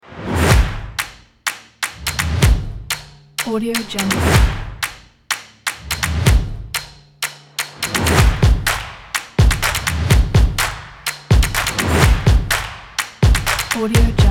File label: stomp